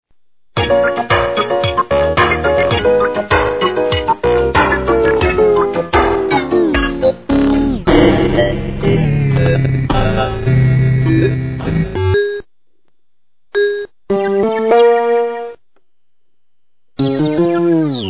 kawasaki

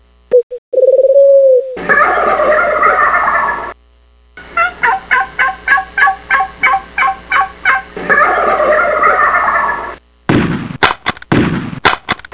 Owl turk

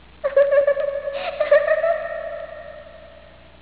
laugh

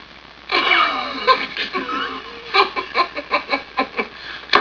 laugh

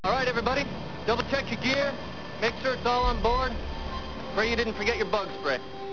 bug spray; anaconda

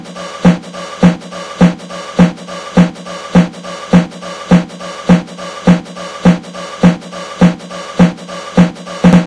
Huntin Trip Song Loop

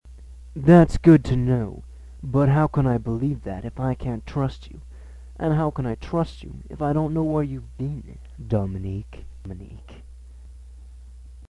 Yoshi legato

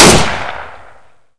MP44 shoot

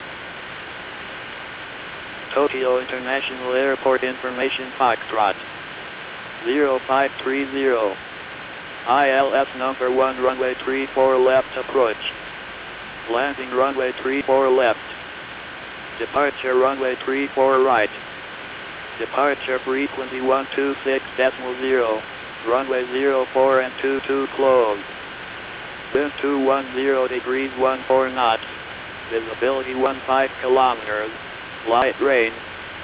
128.8 MHz

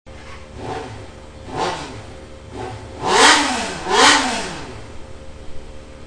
gixxer; erikdred

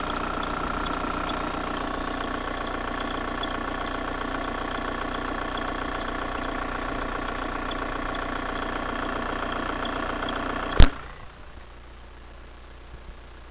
Squeak

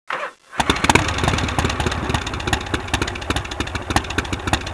hog, motos; cidade

